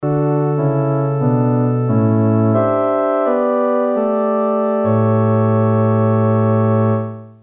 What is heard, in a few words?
Harmonium